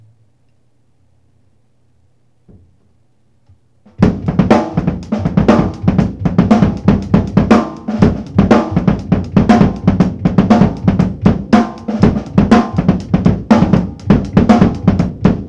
beat sync funk 120, acoustic

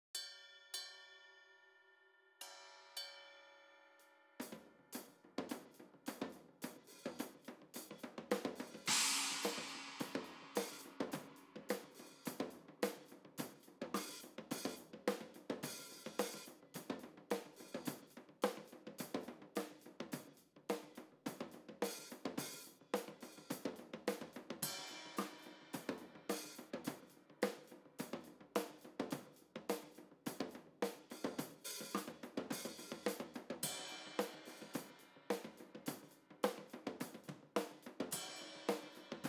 cymbals; AugustRain

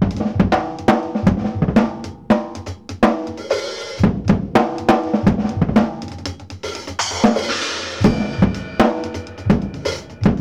beat complex funk loop 120, acoustic

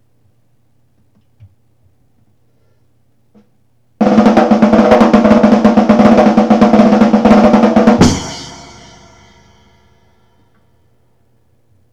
break snare roll 120, acoustic

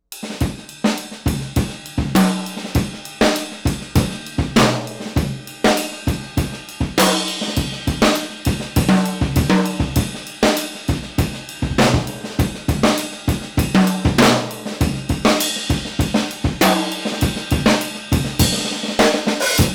beat 100, acoustic

